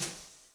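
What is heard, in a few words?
Brush Swirl Time, Electric Snare, Yamaha DTXpress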